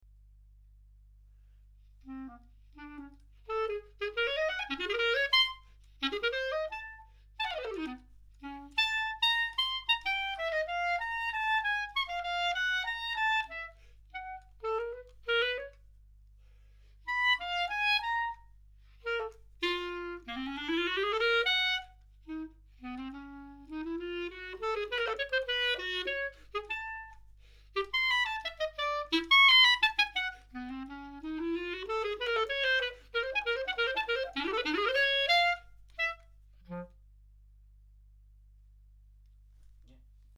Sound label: clarinet